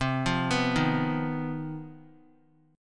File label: C Minor Major 7th Double Flat 5th chord, Guitar; arpeggiated; JGuitar